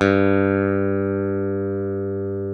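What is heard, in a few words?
SB PICK G1, SPECTOR BASS; Northstar - New Gold VOL-1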